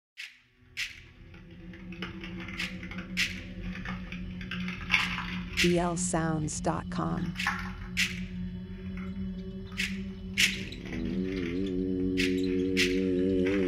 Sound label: Shaman Ambience